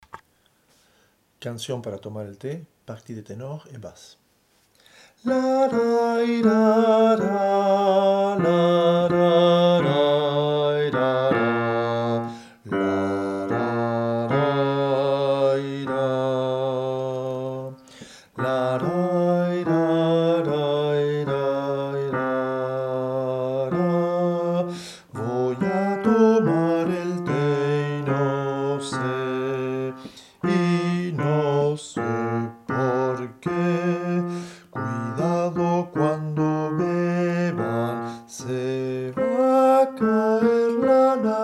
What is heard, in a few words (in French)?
hommes